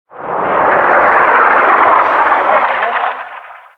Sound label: incoming; ModernWarfare; Explosions